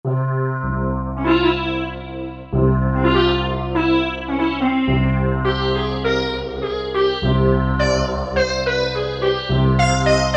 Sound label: Sitar, Korg M1; Factory preset examples